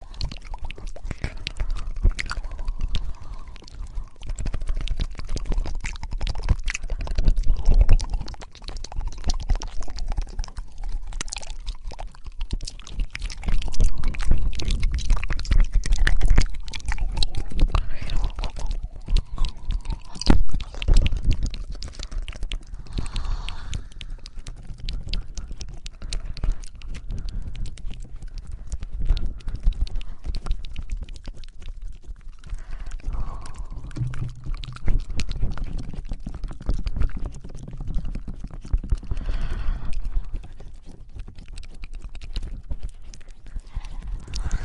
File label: Twin tongue fluttering